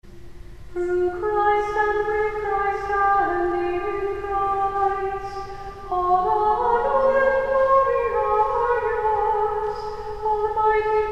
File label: Sursum Corda, Mozarabic chant; St. John's Cathedral, Jacksonville Florida